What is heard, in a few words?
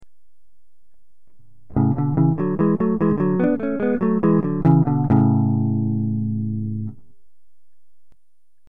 Ion phryg, guitar